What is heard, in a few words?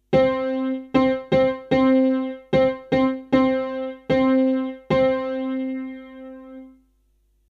monotone music